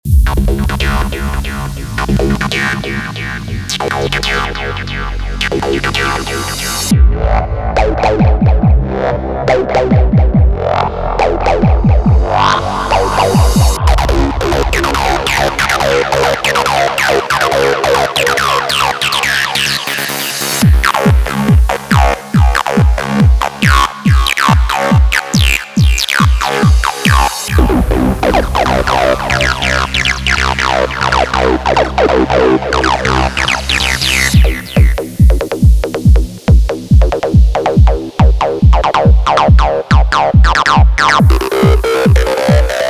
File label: Acid Essentials, trance music; Arturia JUP 8000 V; soundbank; presets